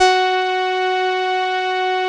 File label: 505, String Machines; Best Service; XX Large Most Wanted 2; PARA-505